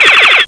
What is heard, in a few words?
shot